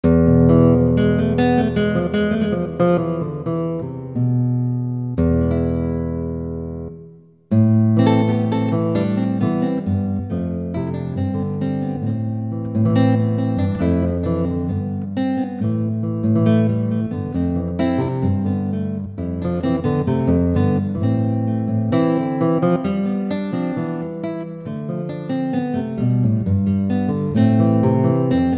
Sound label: CLASGTR; k2600; Orchestral ROM Option